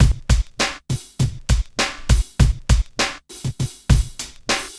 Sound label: Club Tip, R&B Drums; Sonic Foundry (Sony Creative Software)